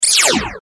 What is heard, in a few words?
laser